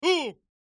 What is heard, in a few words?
Medium Object Place; super stacker 2 online